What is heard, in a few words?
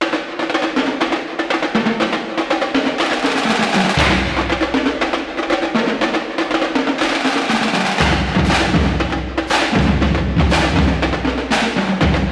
Broadcast Outro; NCAA Basketball 09; PS2, PS3, Xbox 360